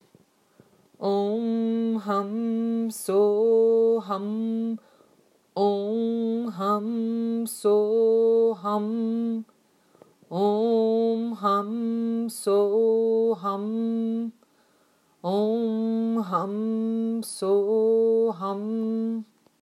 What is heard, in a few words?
om hum so hum